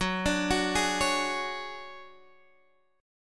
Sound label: D Major 7th Flat 5th, chord